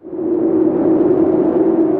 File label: Slow Spread; Tree Tone Presets; Hold Keys; Ableton; Factory Packs; Inspired by Nature; Dillon Bastan